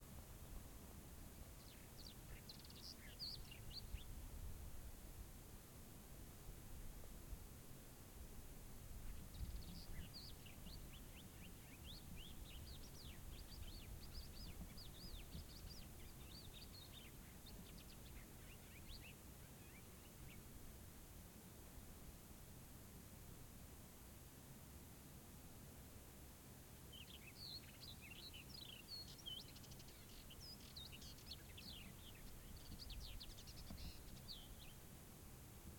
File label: MOROCCO Desert Camp Morning Birds; Background Morocco; Prismo Sound